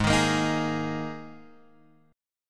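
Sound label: Ab Suspended 2nd chord, Guitar, Open F tuning; strummed; JGuitar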